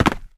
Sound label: wood land; Golden Crayon Sounds